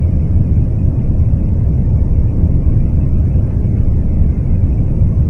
spaceship engine loop

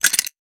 weapon foley drop; Stereo; Real Guns Sound Pack 2

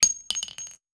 weapon ammo drop; Stereo; Real Guns Sound Pack 2

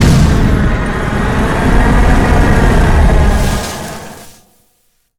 flamethrower shot; Mono; Weapons; Real Guns Sound Pack 2

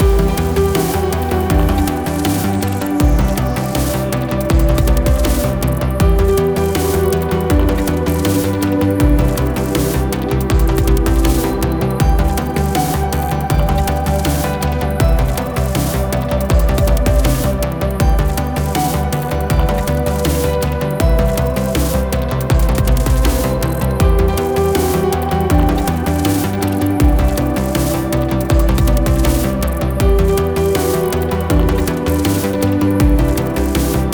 Space Station (loop2), Music

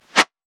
weapon bullet flyby; Mono; Bullets; Real Guns Sound Pack 2

